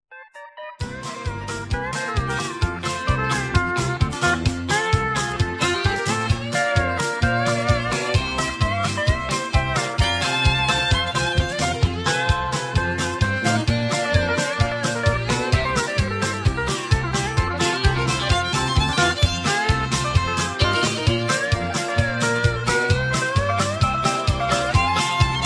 high key

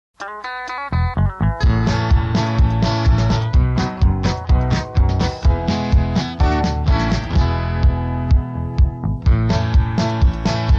Steel Feel Patter

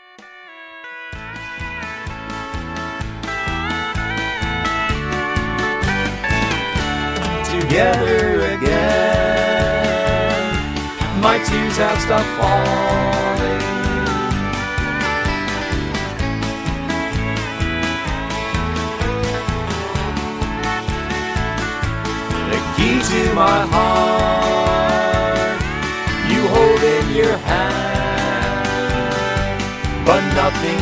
Instrumental with Melody

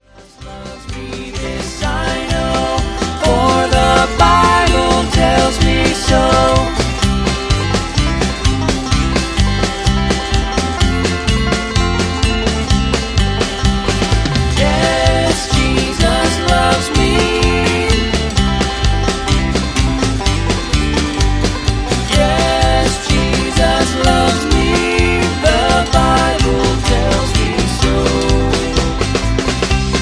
Harmony on All Singing; Singing Calls; Gospel

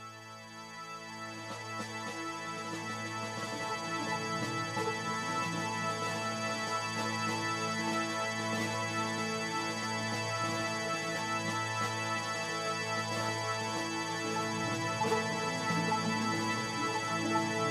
Instrumental

